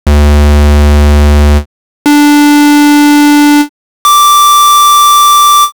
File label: Pulse Standard; Kontakt2